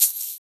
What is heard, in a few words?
Shaker Acoustic; Drums